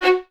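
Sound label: Violins F#4 staccato left; Miroslav Vitous; String Ensembles; 11 Violins; 11 VS Stacc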